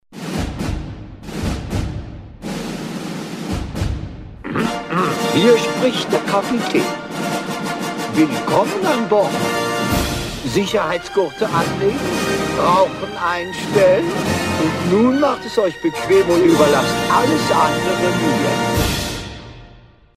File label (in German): Jingels